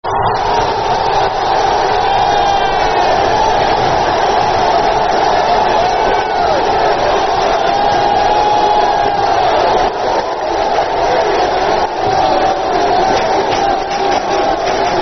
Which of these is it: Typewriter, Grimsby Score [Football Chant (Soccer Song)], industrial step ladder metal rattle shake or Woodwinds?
Grimsby Score [Football Chant (Soccer Song)]